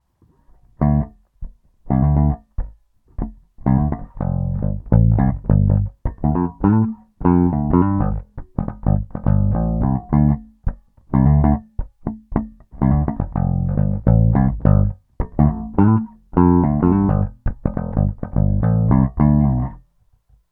Super Neo Pick Tone DOWN, pickups; peavey shootout audio samples

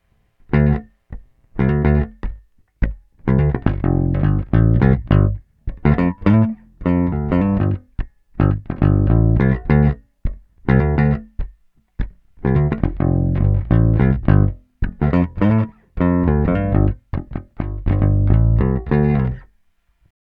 Super Neo Neck Pick Tone UP, peavey; peavey shootout audio samples

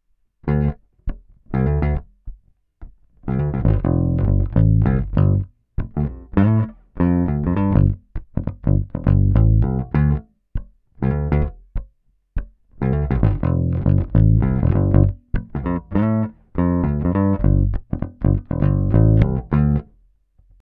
Humbucker Neck Pick Tone UP; peavey shootout audio samples

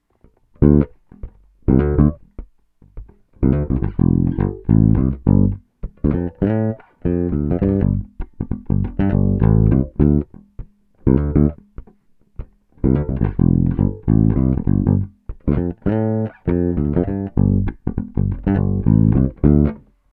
Humbucker Bridge Fingerstyle Tone UP; Peavey based pickup Shootout

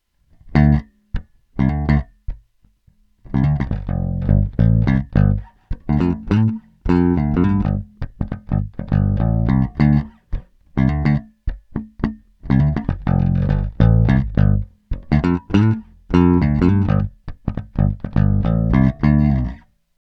Pick Tone UP; 916 Super Neo

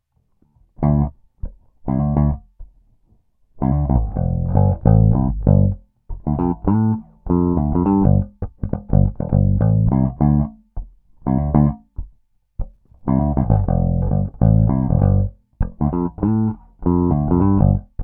Humbucker Pick Tone DOWN; Peavey based pickup Shootout

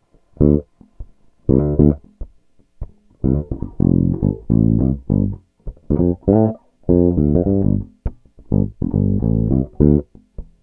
Super Neo Bridge Fingerstyle Tone DOWN, pickups; peavey shootout audio samples

